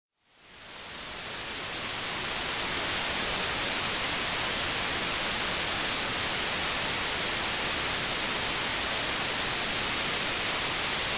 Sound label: White Noise